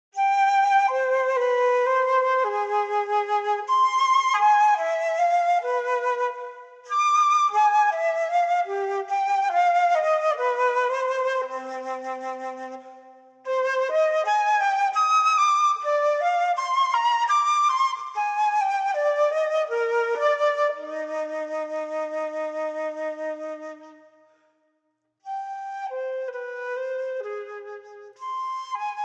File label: Sarabande from Cello Suite no 5, unaccompanied Flute; Andy Findon; Johann Sebastian Bach